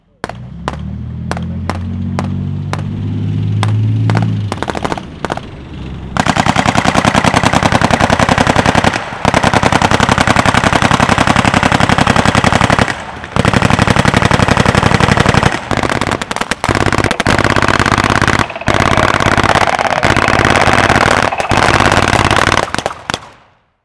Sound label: Amb Destruction; Red Orchestra 41-45